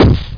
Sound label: gun